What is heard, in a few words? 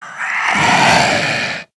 attack, zomb female; nmr